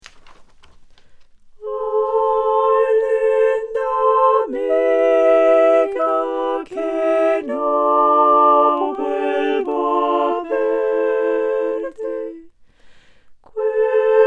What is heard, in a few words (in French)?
Tutti; Ay Linda Amiga